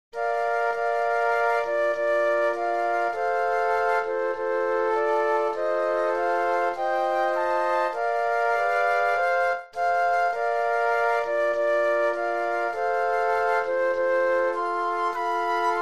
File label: Flute Quartet; patriotic